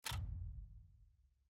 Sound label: ui screen confirm forward; everdawn